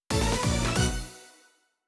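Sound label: Jingle Level Up; Ring Fit Adventure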